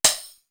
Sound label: TAMBOO; East West; Global Sounds; D4 FXS